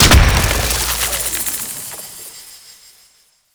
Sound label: Gardening Cactus Explosion; Warframe; Duviri